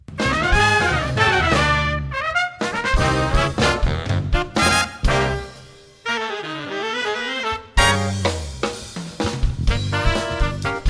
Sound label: Jazz Project